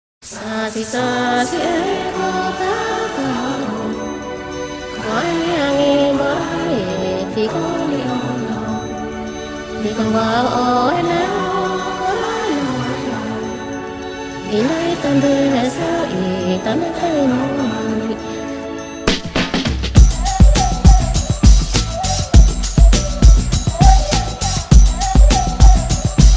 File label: Sweet Lullaby; Oldtimer Muziekbox